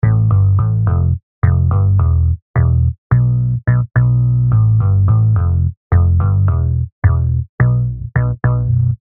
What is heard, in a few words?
Bass wet